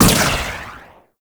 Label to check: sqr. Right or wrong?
Wrong